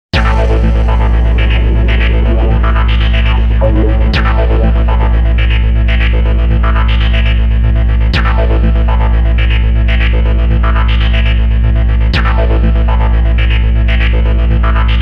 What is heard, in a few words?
Deep Electro Loop; Soundzabound